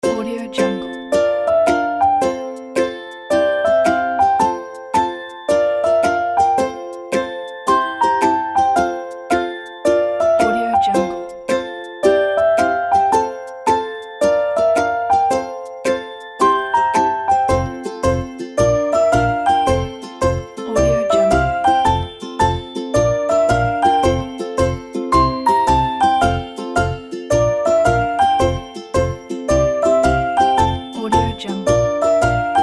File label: easy happy kids song